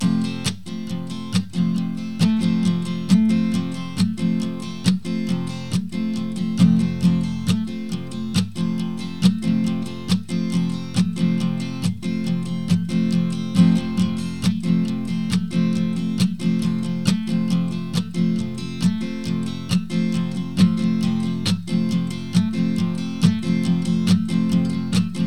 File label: F drone, harmonica